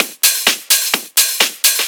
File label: Discohouse Loop 128 BPM